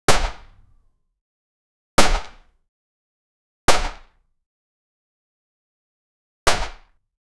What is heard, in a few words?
bow shoot; sound effect